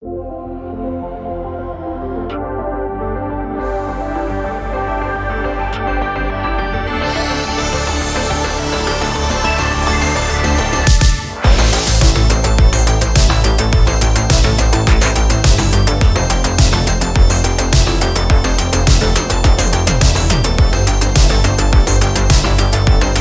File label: Cinematic Synthwave, RINGTONE; MIUI14 and HyperOS